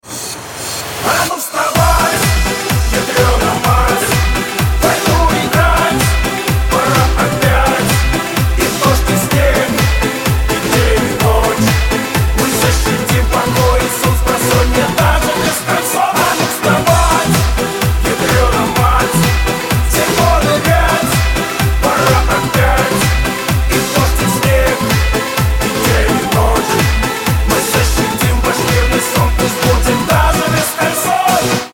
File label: ring